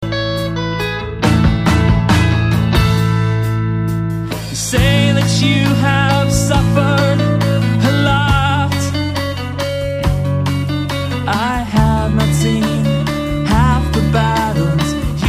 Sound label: sing